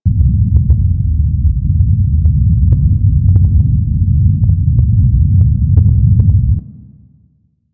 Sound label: Cargo Elevator Amb Low, Ambience